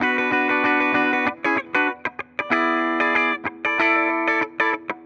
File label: Tele 95 B, Guitar, 95bpm